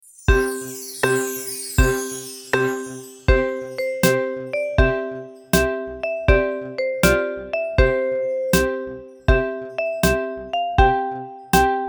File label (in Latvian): Zirneklitis metalafoni